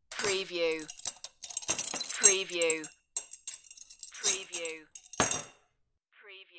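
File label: Metal Bar Tool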